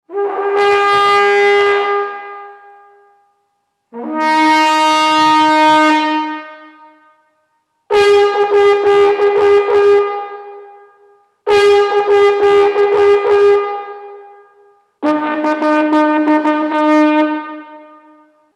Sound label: nefir